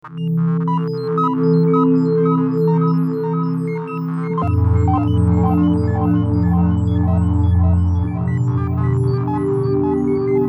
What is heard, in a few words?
melancholy, synths